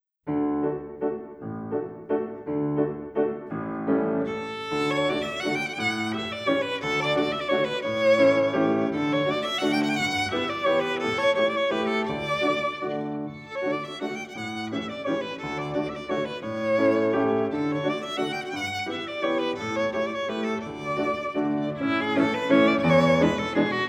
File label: Mazurka; Battements Tendus And Battements Glissés; Op. 33 No. 2